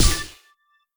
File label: ui start; Crypt of the NecroDancer